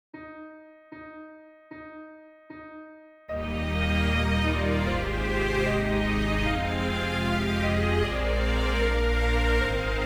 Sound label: Welsh Folk Song